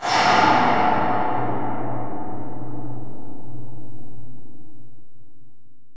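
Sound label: MUSHY STEEL, percussion metallic; Rarefaction; Poke in The Ear With A Sharp Stick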